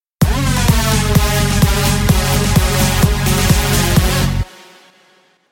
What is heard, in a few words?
bigroom